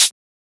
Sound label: Shaker; FLPRO